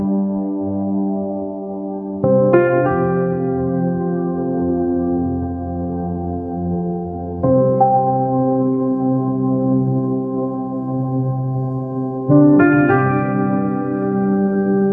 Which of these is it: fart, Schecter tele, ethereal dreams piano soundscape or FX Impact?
ethereal dreams piano soundscape